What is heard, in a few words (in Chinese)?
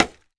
脚手架放置声